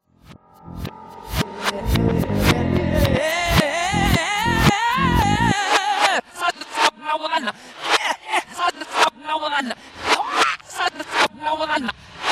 reverse; backmasking contrario

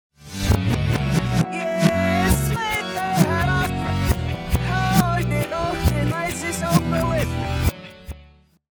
reverse, country rock; backmasking contrario

